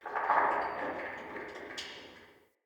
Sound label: Fort Corner Tower Stone Creak; Ambience